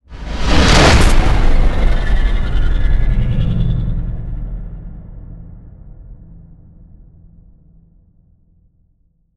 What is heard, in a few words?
Epic